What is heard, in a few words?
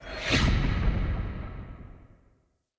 Sparrow Shrine Revive; Animals; Death State